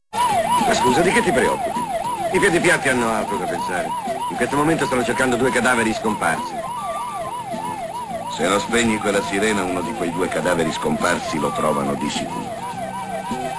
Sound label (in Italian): Sirena